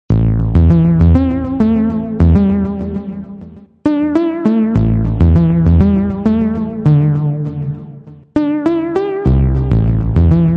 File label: Bounce